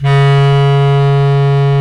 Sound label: Bs Clarinet; Roland L-CDX-03 Disk 1; Lo Clarinets